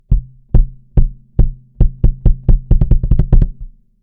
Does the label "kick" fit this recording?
Yes